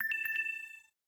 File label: Low Battery In Call; LG; Optimus LTE3